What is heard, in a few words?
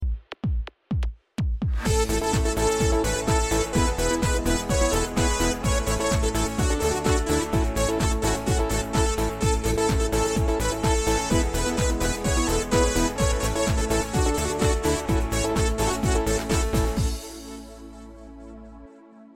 instrumental